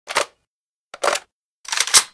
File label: reload, weapons; svu